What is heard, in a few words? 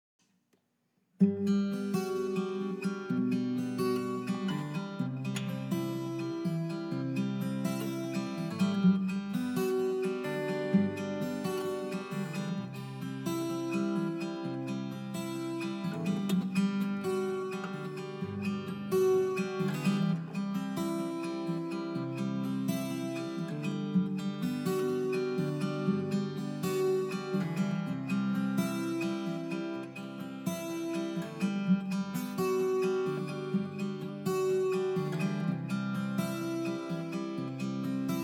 String